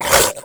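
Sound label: lava baphomet damage up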